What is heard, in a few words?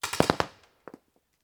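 Paintball Gun Impact; Sound Ideas; Guns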